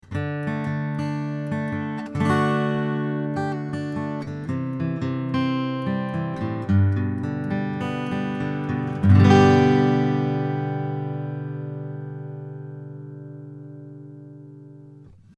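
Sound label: Acoustic Guitar Picking 20cm; Aston Origin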